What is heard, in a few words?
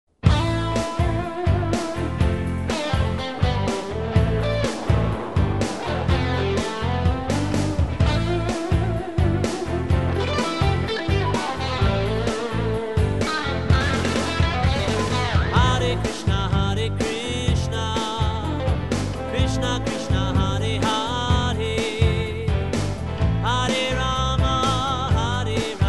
Mantra Chant